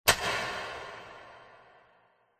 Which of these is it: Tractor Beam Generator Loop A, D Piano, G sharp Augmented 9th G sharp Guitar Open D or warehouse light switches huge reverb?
warehouse light switches huge reverb